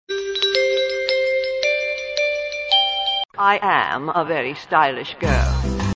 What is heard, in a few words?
stylish music